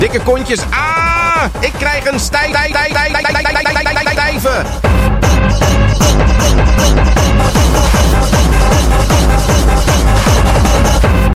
dikke kontjes techno

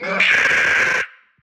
krijs

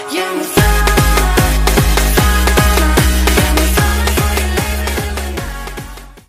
parabool vlammen

